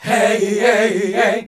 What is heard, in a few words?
HAL GOSP. MIXED